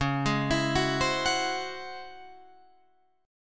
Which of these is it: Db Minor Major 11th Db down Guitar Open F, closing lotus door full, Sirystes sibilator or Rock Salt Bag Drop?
Db Minor Major 11th Db down Guitar Open F